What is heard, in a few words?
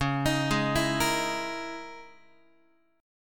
C sharp Minor 6th Add 9th C sharp, Guitar; C#m6add9; arpeggiated; JGuitar